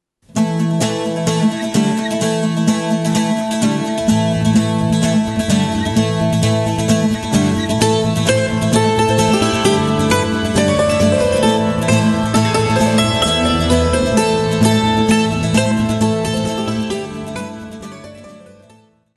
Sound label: Acoustic Celtic Music; Peter's Kick